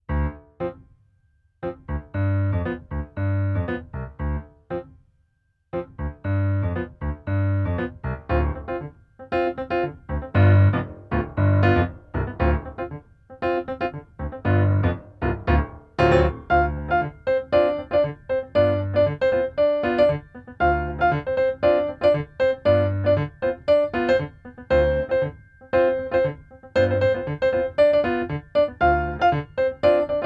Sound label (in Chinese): funk